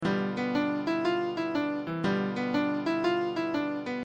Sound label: Blues Dominant